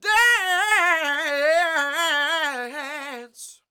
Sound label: DANCE; Spectrasonics Vocal Planet